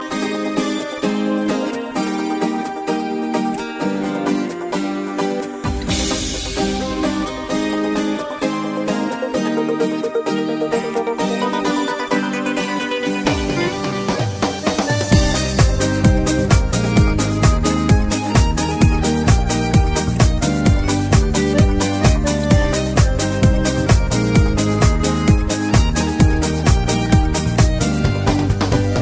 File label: Birthday Ringtone